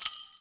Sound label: SHAKER, INDIAN PERC